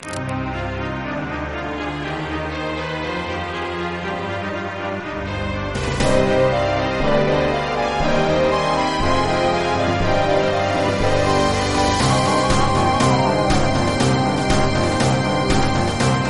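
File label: Last boss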